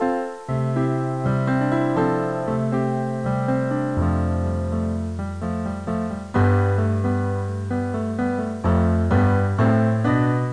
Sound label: Sound Music; Bible Fun Pack